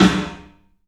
PA DEEP R, CLUB SN VRB; 3D Sound; 3D Drums

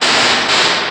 PERC FX

